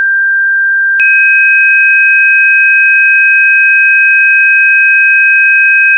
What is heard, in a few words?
g3 + e-flat4